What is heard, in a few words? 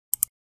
Mouse Click Sound